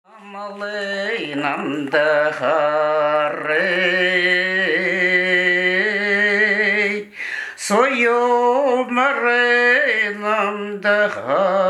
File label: Zinaida song